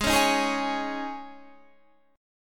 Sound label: G sharp Suspended 4th G sharp, Guitar Standard; G#sus4 strummed; JGuitar